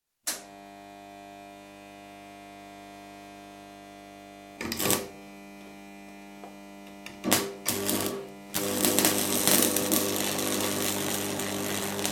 Arc welding machine, switch on, strike arc 2nd attempt, weld off; Welder Sound Effects; BBC